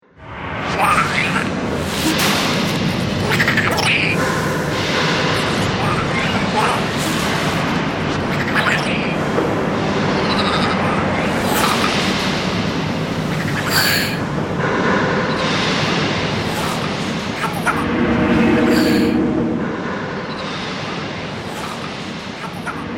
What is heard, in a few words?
Goblin Atmospherics, monster